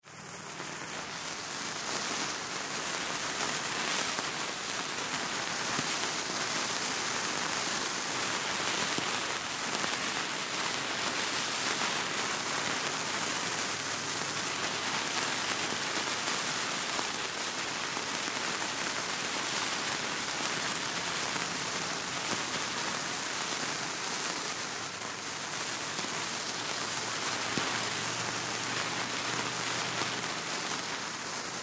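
Fire Sparklers Multiple Burn Gentle Moves Extreme Close Up; Fourth of July; Big Room Sound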